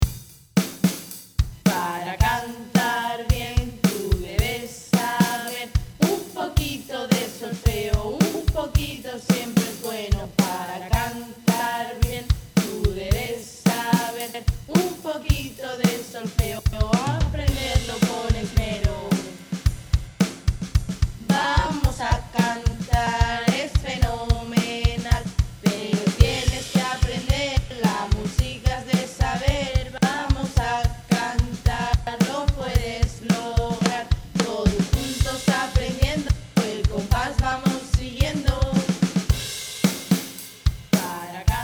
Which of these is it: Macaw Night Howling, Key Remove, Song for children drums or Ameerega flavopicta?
Song for children drums